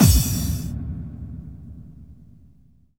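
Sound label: bass drum FX, dance drums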